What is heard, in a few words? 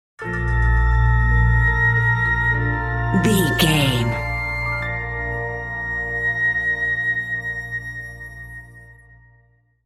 ancient temple ruins stinger